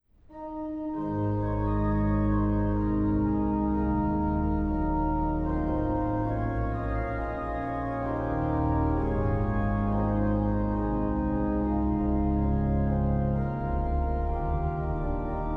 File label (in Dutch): orgelsolo; Op U mijn Heiland blijf ik hopen